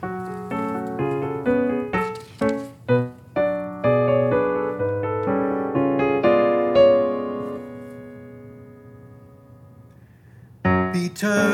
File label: Peter Piper 3pt Mixed Baritone